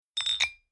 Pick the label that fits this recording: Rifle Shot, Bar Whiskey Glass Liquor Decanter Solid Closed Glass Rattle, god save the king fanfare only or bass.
Bar Whiskey Glass Liquor Decanter Solid Closed Glass Rattle